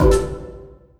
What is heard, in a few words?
Oneshot; Sonic Foundry (Sony Creative Software); Esoterik Beatz; Messup